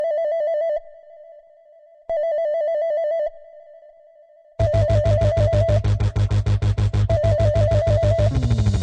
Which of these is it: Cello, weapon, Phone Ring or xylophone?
Phone Ring